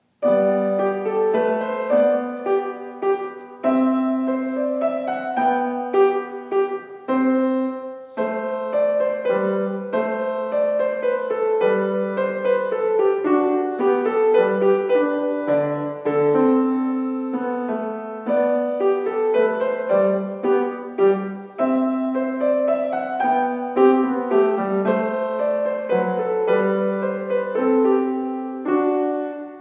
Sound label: minuet bach